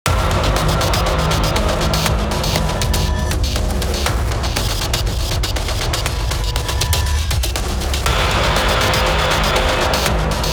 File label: Combat Rythm; Excubitor